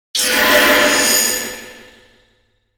Whooshes Multiple Reverb Fast, Whoosh Flange Crystal Front To Rear To Front; Production Element; Surround Sound Imaging Element Accent Transition